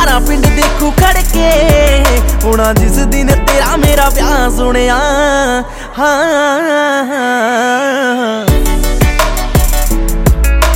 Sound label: Ringtone Punjabi